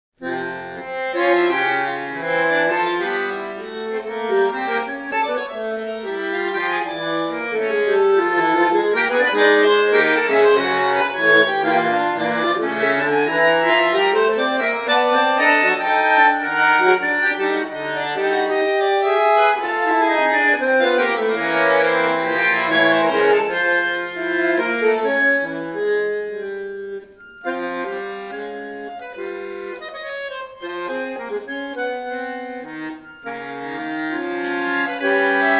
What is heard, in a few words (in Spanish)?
musica; CONCIERTOS EN VIVO; Museo Sivori